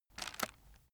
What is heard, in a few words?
chocolates take soft